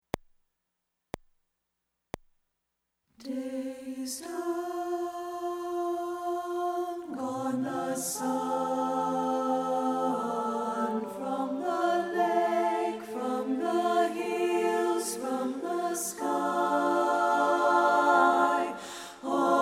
ALTO; satb

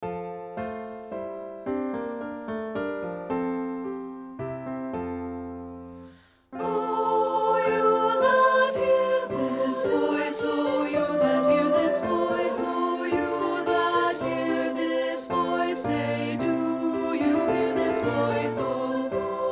ALTO

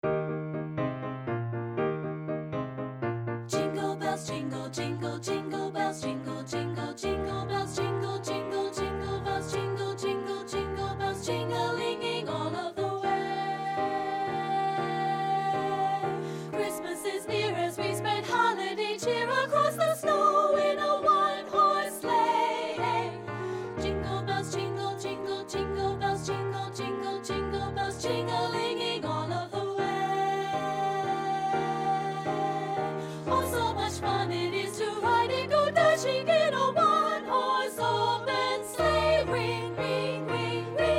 JINGLE BELLS SOP; partbypart; satb

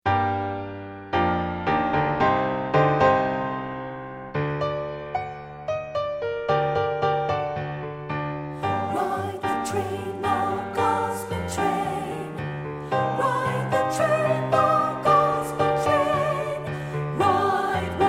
Ride the Gospel Train SOP, satb; partbypart

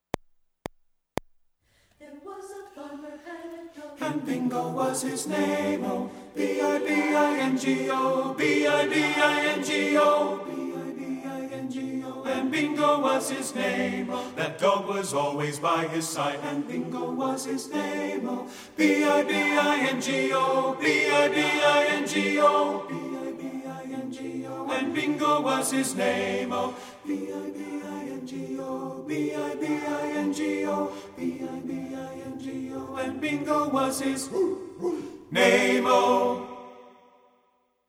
TENOR; satb

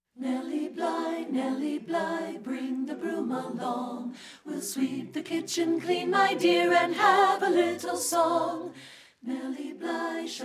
ALTO; satb

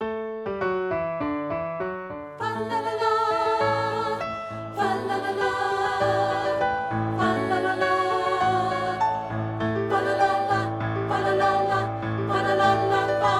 ALTO; satb

